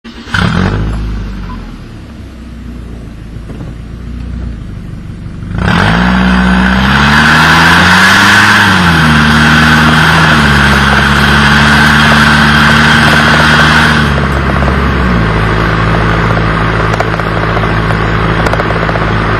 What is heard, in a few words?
on out